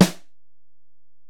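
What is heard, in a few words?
Acoustic Snare, Alesis DM-PRO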